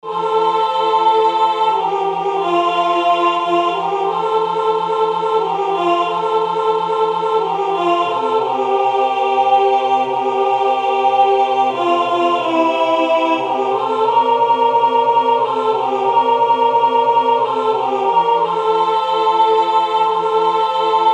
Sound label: alto